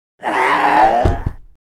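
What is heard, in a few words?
curse rich death, monster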